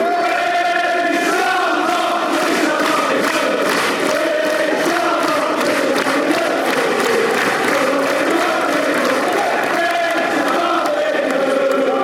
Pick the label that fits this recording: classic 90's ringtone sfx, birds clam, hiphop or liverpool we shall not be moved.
liverpool we shall not be moved